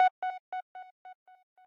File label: arp